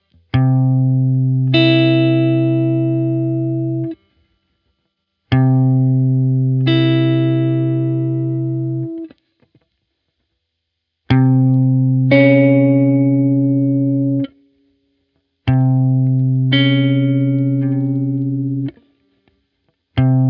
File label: intervals beyond an octave